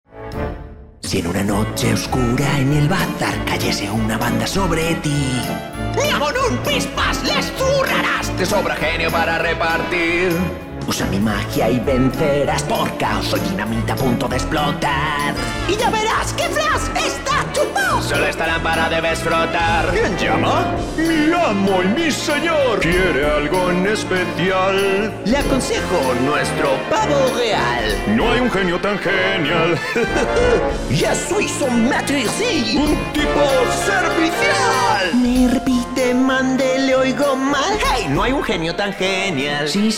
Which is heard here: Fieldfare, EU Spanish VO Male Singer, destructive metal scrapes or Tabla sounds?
EU Spanish VO Male Singer